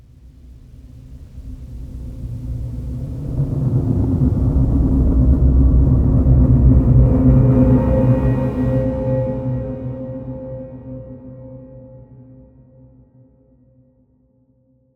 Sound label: Dungeon; SFX; Ambient - General